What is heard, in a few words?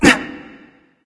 gruntjump; smite; voicepack; mercury